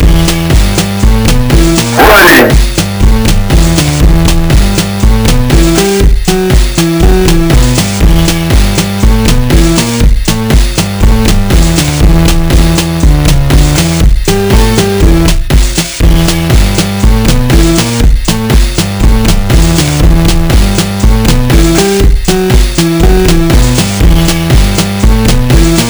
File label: Choppy Emulator Music; Awful Playstation Emulator